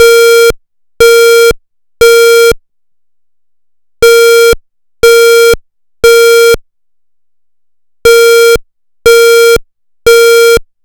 Interrupted ISO8201 USA, ISO 8201 (emergency evacuation signal), USA (evacuation alarm); Interrupted tone; pfannenberg; signaling-technology; combined-signaling